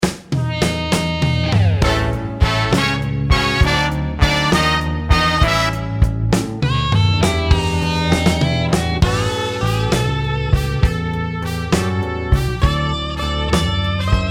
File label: Dragon Blues